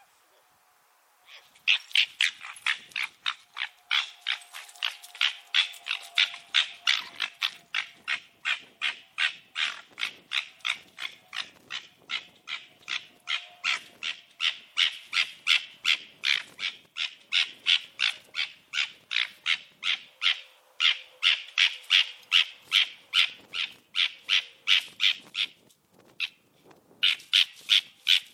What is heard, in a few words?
red fox vulpes vulpes